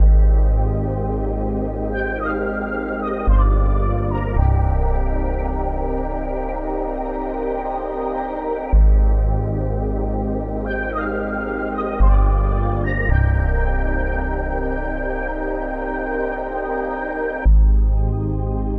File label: 110 BPM C Min, RnB; Melody; Cymatics; 2022 Melody Collection (BETA)